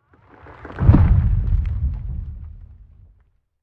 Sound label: Dino Footstep Filtered; Angry Birds